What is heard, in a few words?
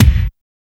POP KICK; Drums In Your FACE!; BONUSKIK